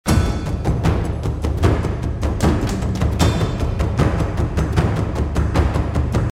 drums mix, epic orchestral; epic orchestral track

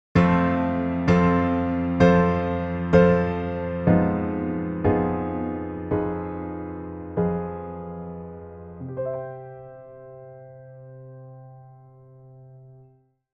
Romantic Ending, altered chords; altered vii

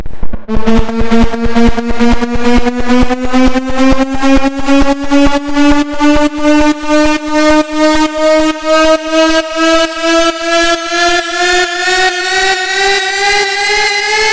pitch riser; long pitch bend risers

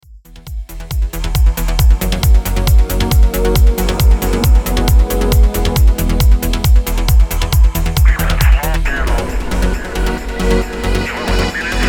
high pass, electronic productions; reducing mud

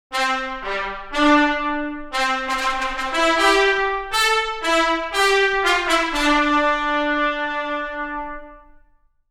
Tpt Ens; Cinebrass

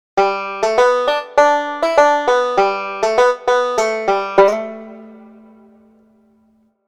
Banjo; Assigning different samples to different velocities in the same instrument

